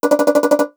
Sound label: alert